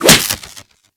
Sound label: Whip Impact Default, Weapons